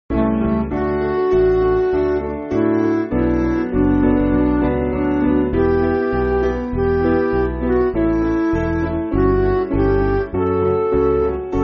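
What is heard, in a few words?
Midi, Backing Files for Churches; A New Commandment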